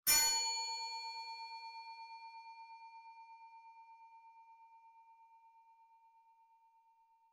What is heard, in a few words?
Market Alert